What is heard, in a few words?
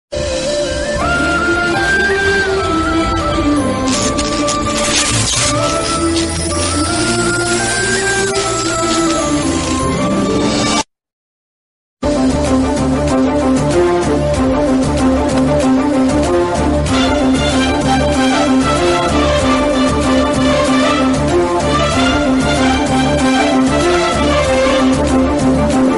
telugu bgm ringtone, love bgm; susanth